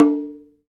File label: Bongo Low; Sonic Foundry (Sony Creative Software); Joe Vitale Latin Percussion